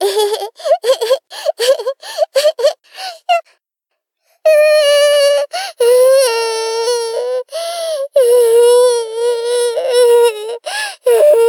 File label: Baby